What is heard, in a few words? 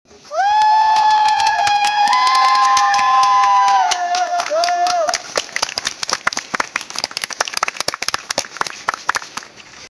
a woman cheering the name